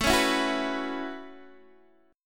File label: A 6th Add 9th, Guitar, DADGAD; x,12,11,9,9,9